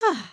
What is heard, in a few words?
big love, emotes